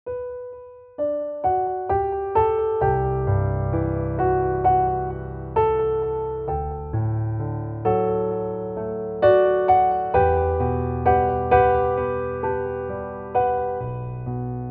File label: Piano